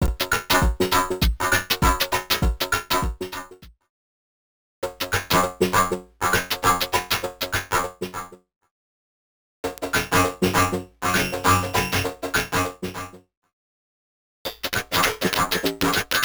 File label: Lo-Fi 100bpm; X-Static Goldmine 5